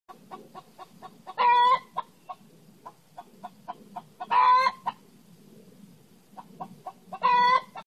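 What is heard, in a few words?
hen clucking; ga cuc tac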